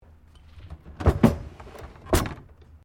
Drawer Utility Large Full Open; Various domestic drawers; Household

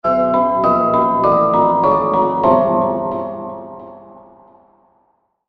Going Down Interlude, musical sound effect; horror sound effect

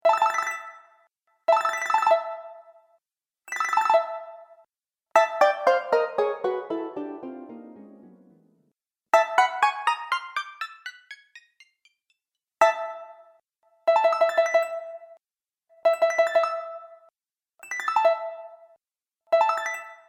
Bright Sound - Video Game Soundpack; Video Game Sound Effects

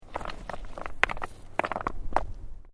Bricks Movement Minor

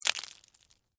Texture, Debris, Waffle, Cones; Debris (Sound Effects:Materials)

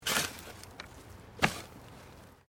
Digging Shovel Sand

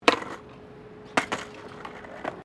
SKATEBOARD SKATE BOWL DROP; Skateboard sounds; Skateboarding; Sports; Foundation4

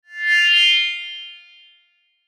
Chime Musical, Chime Musical Multimedia; Synth; Multimedia Web Interactive; Chimes; Blastwave

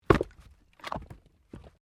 Wood Chopping Axe; Objects

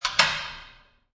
Restroom Lock; Public restroom stall door locked

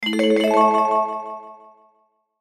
Chime Musical Delay; Chimes; Blastwave

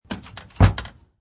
Front door is shut; Miscellaneous Doors

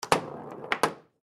Foosball Table; Foosball Table Kick Ball Block Hard; Sports

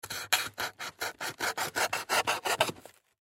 Cardboard Cut Knife; Materials; Blastwave; Foley

